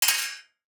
DICE on Metal, Throw and Roll, Mini, 2 Two Dice; Samples; ArticulatedSounds; DICE Sfx Party (Extended)

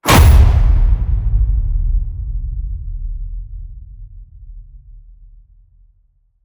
HIT Metal Crash, Cinematic Hits Designed

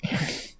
exert; blindside

